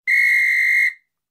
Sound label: whistle; mms